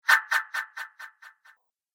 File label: Echo And The Whoosh; Andrew Fleming